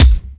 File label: crisp steel kick drum b key